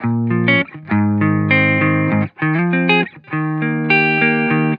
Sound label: Vibrations Pop Guitar Loop 100 BPM G# Maj; Cymatics; Vibrations Guitar Sample Pack